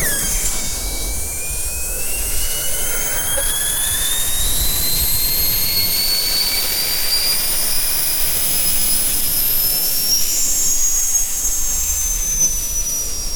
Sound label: laser zapping from alien, alien weapon